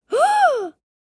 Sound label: Surprise gasp boy child kid